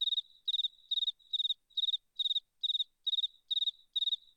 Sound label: cricket